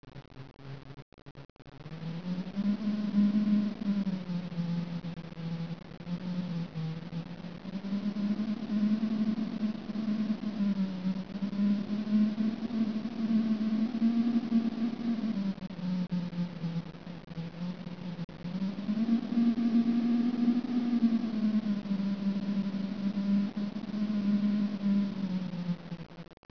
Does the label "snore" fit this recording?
No